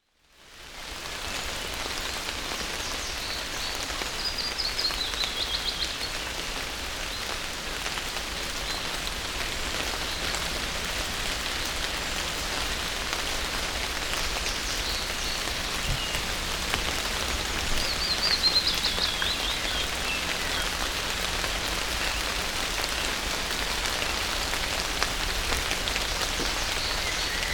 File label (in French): Pluie en foret; bruitage; Climat